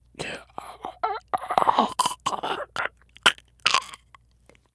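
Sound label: ambient zombie; cstrike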